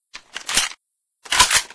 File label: sniper reload first seq; unreal2